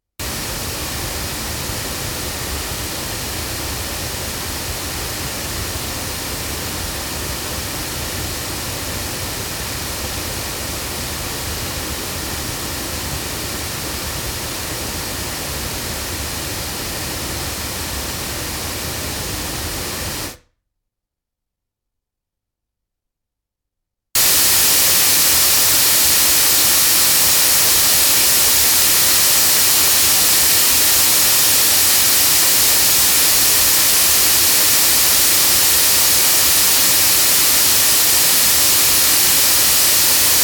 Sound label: loudspeakers; GoldenAge TC1; microphones